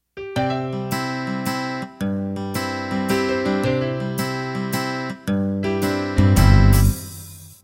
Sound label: Country 3-4 Intro